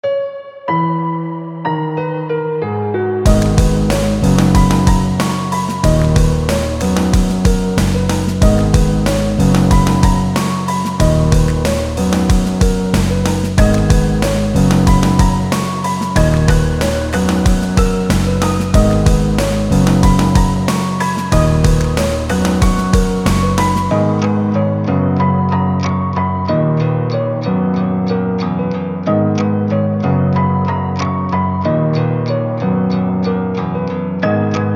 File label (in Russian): Пианинка